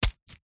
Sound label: horror gore impact fresh fleshy guts bloody punch stab